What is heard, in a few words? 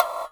HI PERC